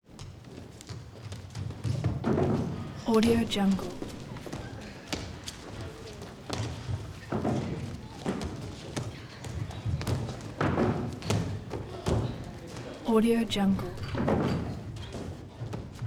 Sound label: gymnastics training younger females work out; prosoundfx